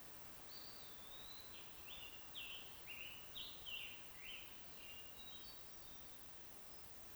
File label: bird vocalizations, Gatineau Park; Buxton Research